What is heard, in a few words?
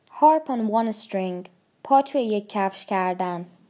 harp on one string